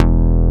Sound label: MINIMOOG